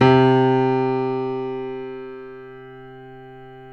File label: PNO F; Synclavier Sampler Library